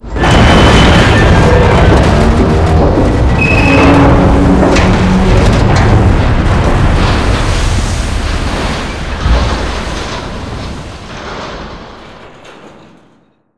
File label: girders break; hl2 ep2; ambient, levels, outland